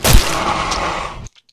demon attack

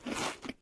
eating

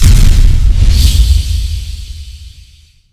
ethereal enter; civ13; civ14; Magic

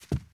carpet, Footsteps; civ14

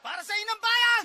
charge, Voices Emotes

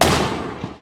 gun mortar fire; Weapons; RMC

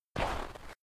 snow, footsteps; civ14

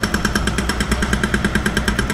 drill, Vehicles Engine

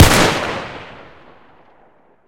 battle rifle, Guns Fire; Weapons

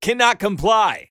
negative, Voices Emotes

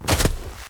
snow step, Footsteps

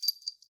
jester step, Footsteps

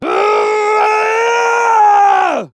male generic warcry

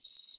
nymph chirp; Animals

